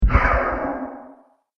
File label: Scifi Weapon Shot, laser gun